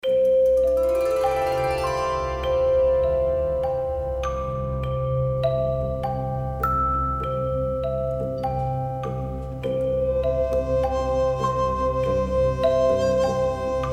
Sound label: bells